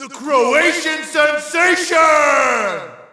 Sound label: Shout, audience; UFC Tapout 2